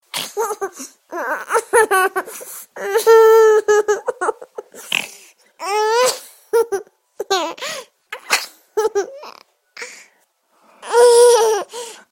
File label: babygirl laughing; Sayings